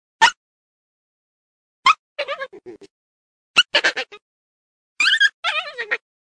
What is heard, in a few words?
Coyote Whimpers